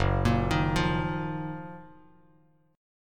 F sharp Major 13th Bass Standard

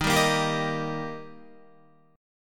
Eb Major, down, Guitar; strummed; 4F,4A,5C,5G,5C,6E

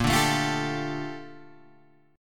Bb Major 13th, Guitar, Open G

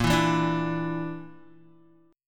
A sharp Major Flat 5th A sharp down Guitar; strummed

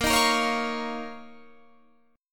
D sharp 7th Suspended 2nd A sharp

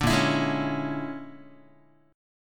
A sharp 7th Sharp 9th Flat 5th, Guitar; strummed; 5,6,7,6,5,x; chord; JGuitar

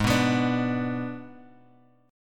G sharp Diminished G sharp; G#dim Chord {4 2 X 4 3 X} strummed

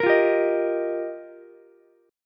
F 7th Suspended 2nd Suspended 4th, down, Ukulele; F7sus2sus4; strummed; JGuitar

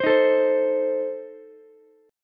A Diminished A 17,15,17,15 down Ukulele; Adim strummed; JGuitar

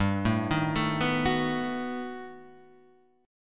B 7th G; B7/G arpeggiated; JGuitar

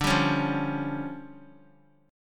D Minor Major 7th Double Flat 5th, D, Guitar

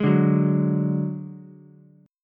F sharp Suspended 2nd Sharp 5th, Ukulele; strummed; JGuitar

